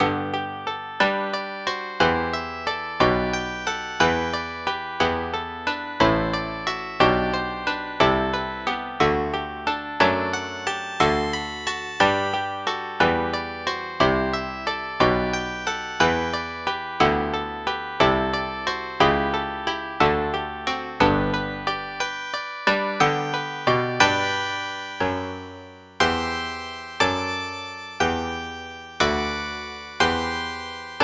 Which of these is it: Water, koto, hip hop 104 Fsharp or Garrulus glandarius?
koto